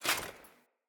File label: Foley Action Armour Walk; Player; Deora Armour